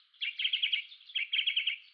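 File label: PALLIDA, Darwin's Finches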